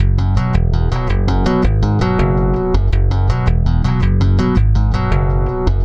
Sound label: Stick Loop, Stick Bass